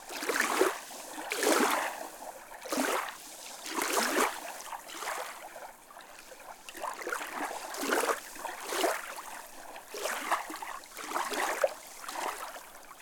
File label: Lake Small Sandy Beach Loop, Cascadia Shore Waves; Nick McMahan